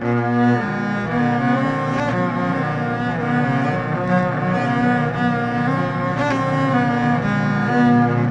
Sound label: loop, techno atmos